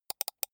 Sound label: computer mouse left click double soft fast